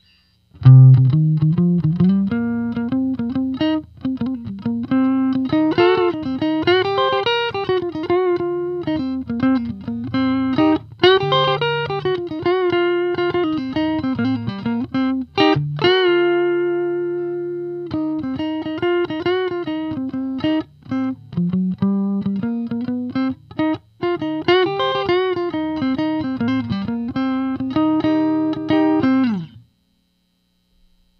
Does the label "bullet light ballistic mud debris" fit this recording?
No